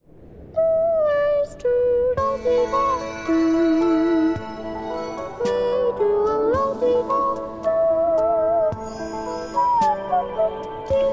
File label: Lullaby; Puppycats